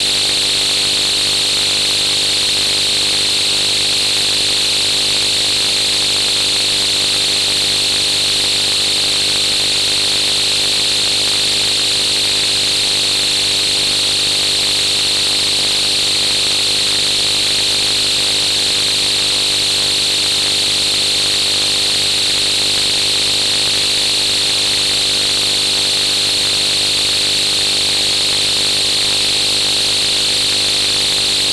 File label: Signal Interference